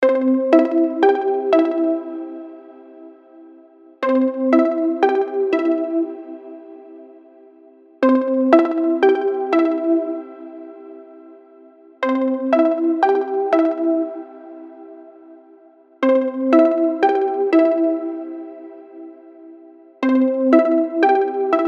phone ringtone trance

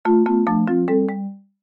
marimba ringtone

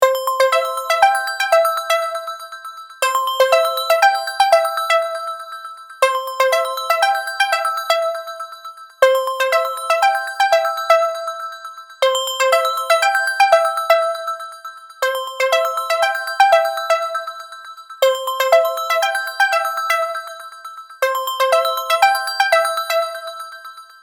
phone ringtone bells

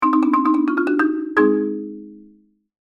marimba ringtone

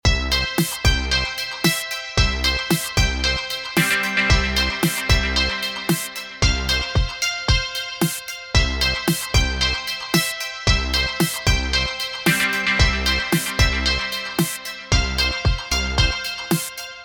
big beat loop

